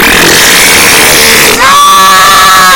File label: man scream